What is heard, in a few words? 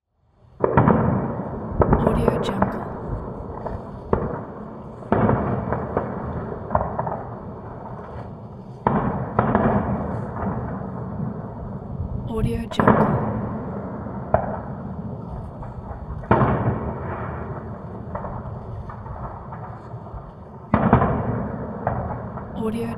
firework